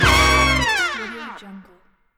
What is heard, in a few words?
big band win; drtimwaters